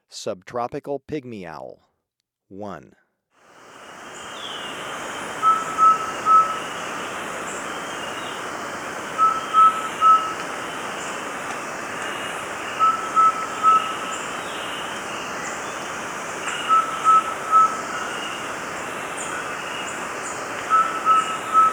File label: Subtropical Pygmy-Owl, Birds of Ecuador; S. America